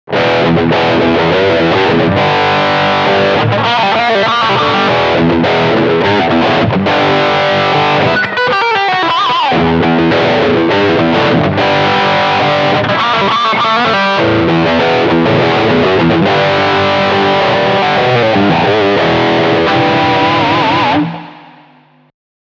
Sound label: Crunch Riff Mittenposit